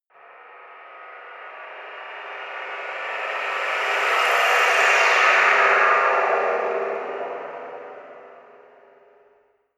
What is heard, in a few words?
passing metallic wave, risers